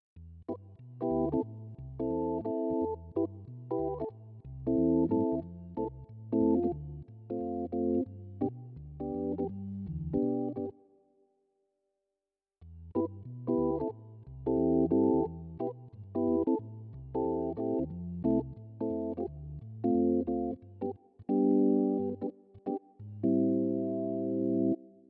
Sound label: Roland RD 800 Tonewheel Organ